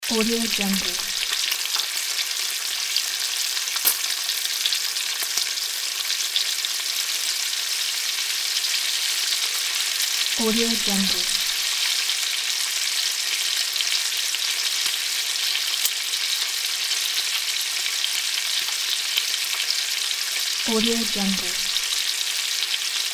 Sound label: frying pan cooking loop